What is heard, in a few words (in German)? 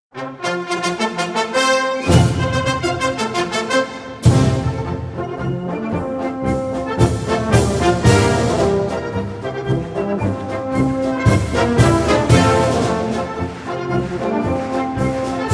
marsch